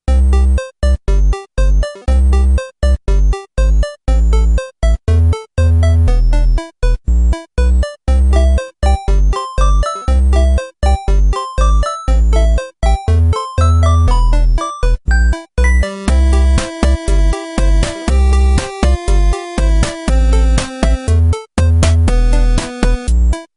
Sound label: Bleep